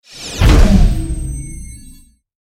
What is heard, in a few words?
Failed run